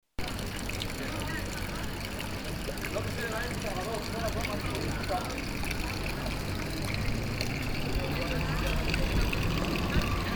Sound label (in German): Brunnen am Taubenmarkt